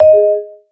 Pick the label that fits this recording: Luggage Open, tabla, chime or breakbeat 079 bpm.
chime